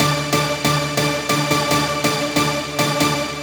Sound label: 140BPM D; Vengeance - Essential Clubsounds Vol.4